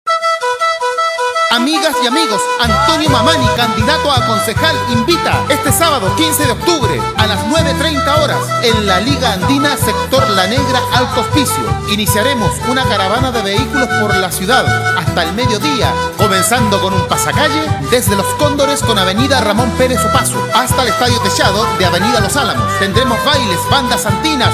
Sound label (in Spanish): JINGLE CIERRE CAMPAÑA; Grabacion..para--CAMPAÑAS